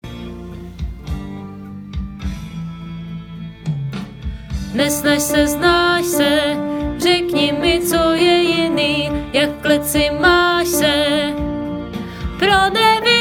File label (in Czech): Malovani hudba klavir zpev